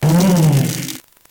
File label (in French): Cri; NB